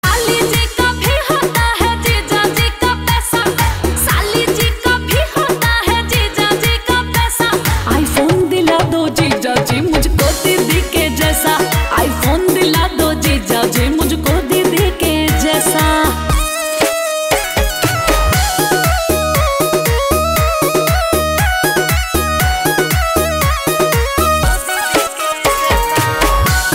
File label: Haryanvi Ringtone; IPhone